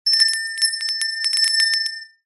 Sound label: bell small ring close clean shop door bell